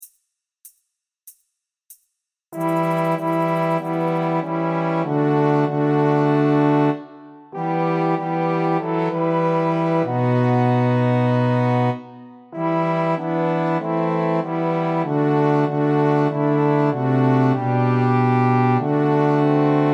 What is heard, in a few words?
Karaoke Fakamaloia a e Eiki